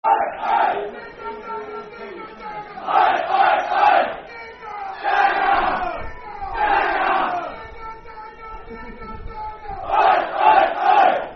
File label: Digger Dagger Shiggy Shaggy Oy Oy Oy Oi Oi Oi Football Chant (Soccer Songs)